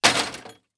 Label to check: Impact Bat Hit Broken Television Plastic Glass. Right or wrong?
Right